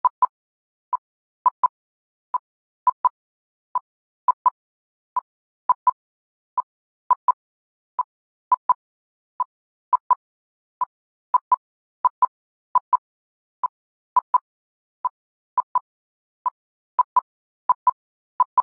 music envelope; Blind Drive

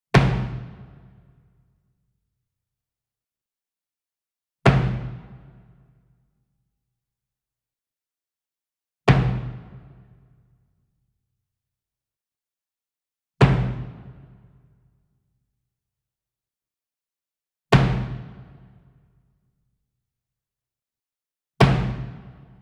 Bass Drum Marching Hit Soft Beater Medium; SINGLE DRUM; boom library; Cinematic Strikes Construction Kit

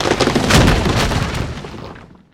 Crack Land Mass Fall Away; SFX Kit; Creaks, Scrapes, & Misc

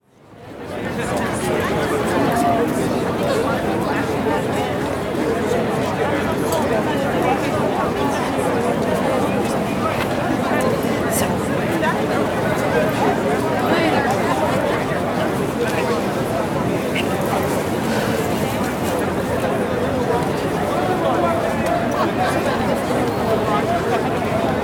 Crowd Outdoor Busy City Marketplace, Walla; Sound Ideas; Walla Series

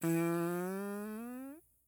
bad roll; Dice World

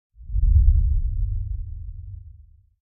Impact Dull Distant Sub Energy Short Reverb Drone; Hollywood Edge; sound designer's toolkit v2

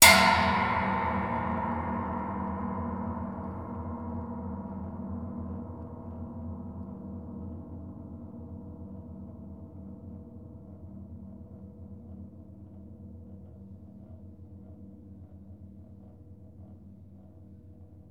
single hit single string; Cinematic Metal Impacts

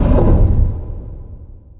Door Large Metal Stop; SFX Kit; Doors

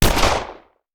Hand Gun; Sound Ideas; Guns

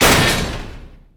Door Large Metal Close; Doors

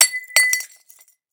Wine Glass Break; Crashes; 1001 Sound Effects

